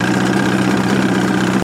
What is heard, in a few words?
115 hp Evinrude Boat Idle Loop Mono